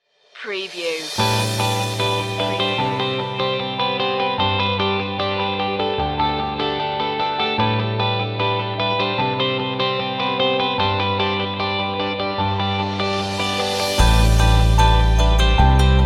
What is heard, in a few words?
no vocals